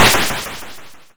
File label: Snare, YM, CHIPSHOP HITS DRUMS; ChipShop Deluxe